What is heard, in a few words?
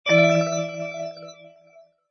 Music Highscore